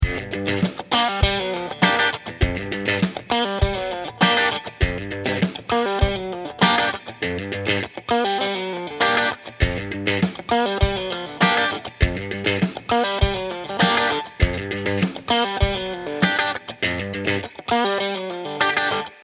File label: Drum